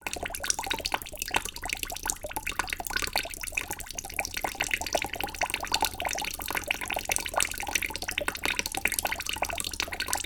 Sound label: household water from tap trickle into plastic sink bowl light variations in speed